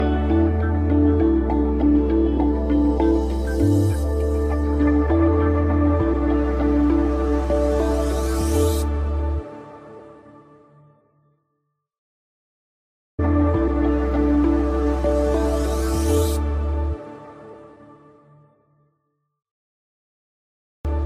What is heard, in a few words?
alarm nokia e52 ringtone